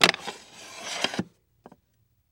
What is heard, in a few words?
Window Wood Slide Old